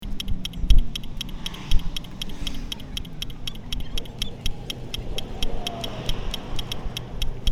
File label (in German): freigabesignal, ampeln; verkehr